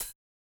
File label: Cl Hat, 140bpm, Grime; Zero-G Sound Sense Grime Scene; Construction Kits; Its Primetime